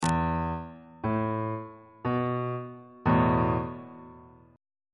e suspended 4th chord on bass clef

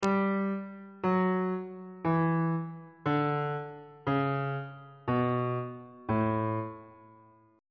a mixolydian mode descending on bass clef

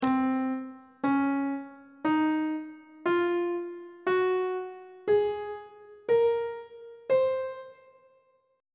c locrian mode note positions; on treble clef

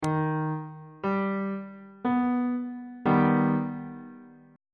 c flat augmented 1st inversion

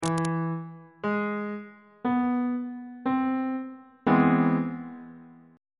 c augmented major 7th 1st inversion on bass clef

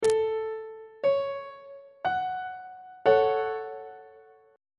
f sharp minor 1st inversion on treble clef

